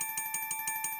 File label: Win Count Up Loop; netent